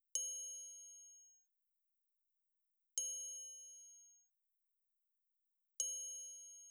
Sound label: metal impact